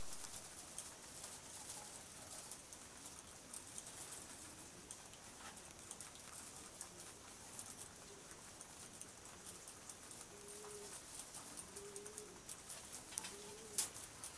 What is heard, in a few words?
rabbitry sounds